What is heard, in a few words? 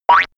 boing special